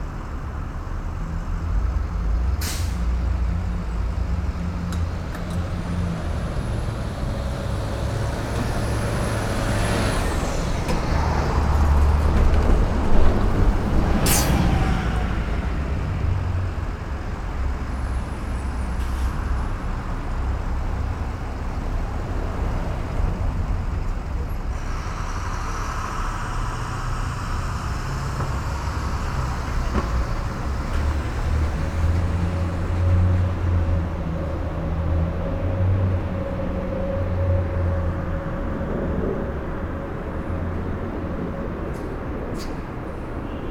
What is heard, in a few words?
Urban traffic light free flowing closer; urban and suburban; boom library